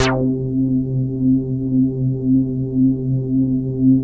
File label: JUNO 106; Masterbits; Vintage Voltage; SEQ-FX